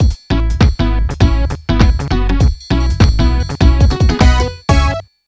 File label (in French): JINGLE DINO; REPERE; prehistorik man dsiware ds gamerip 2010